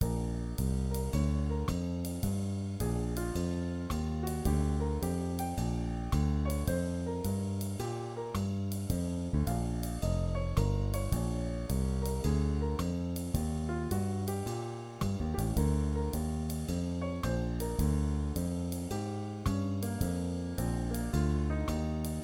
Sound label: Blues for Concert Band